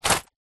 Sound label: purse pouch leather containing coins and notes single shake; foley